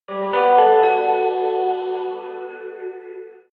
Jingle; Black ops 3

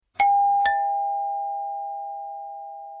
Doorbell

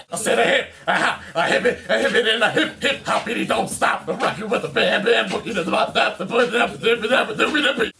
Hip Hop

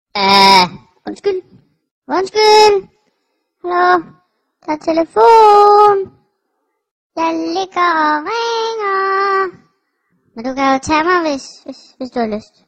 Ringtone; Dansk

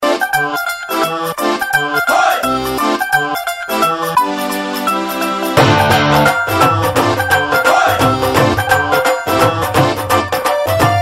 Punjabi Tumbi

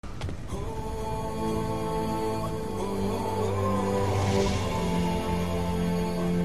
Cool Sound

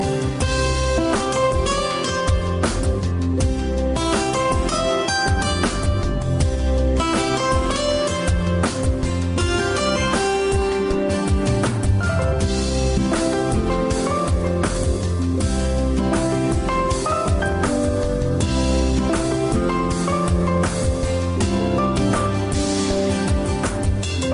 Dance Tune

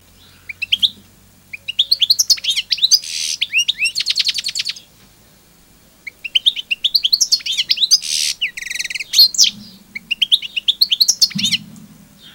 goldfinch; Karderina

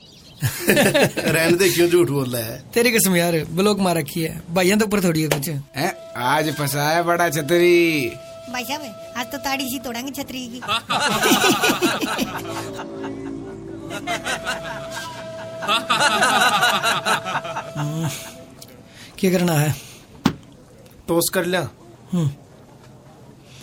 haryanvi song

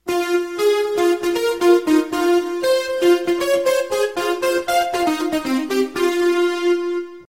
Disco Music

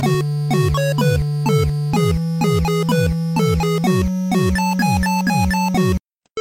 8bit; Coffin Meme

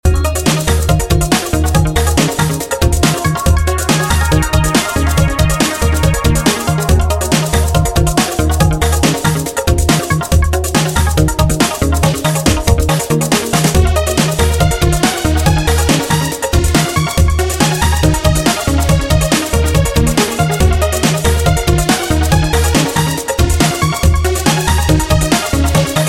Bit Loops

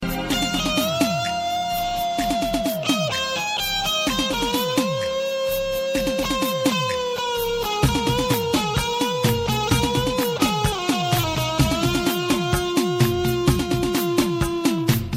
Tone

